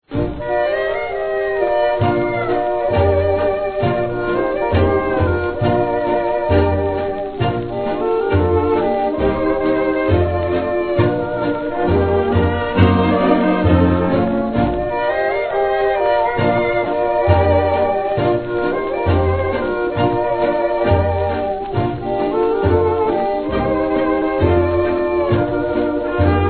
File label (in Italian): ORCHESTRA JAZZ SINFONICO; 1937; 78 giri; Dal film " VIVERE "; COLUMBIA